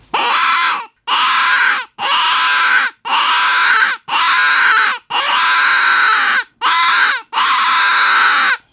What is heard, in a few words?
Ghost Runner, fox caller; T2 Black; 3D printed fox caller